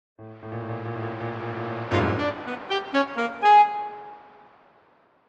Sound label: SFX Game Over; Dark Grim Mariupolis